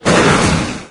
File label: pain left arm, wraith; doom3